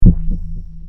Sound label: thump; Sex Traveler Comic Convention